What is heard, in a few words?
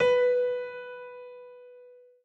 dial tone piano; Oppo R7